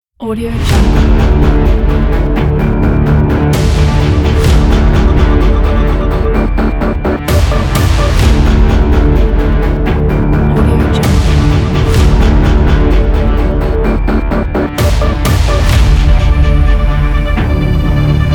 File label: epic aggressive blockbuster trailer